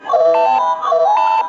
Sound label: notification; Kotor 2